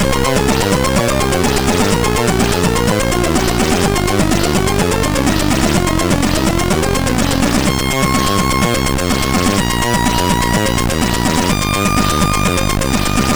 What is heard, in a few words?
Trance, MOS6581R3